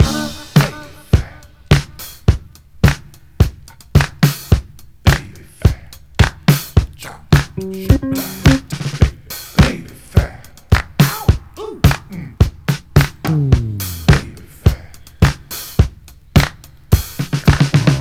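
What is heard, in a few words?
107 bpm drum groove d key, kick tuned to the D note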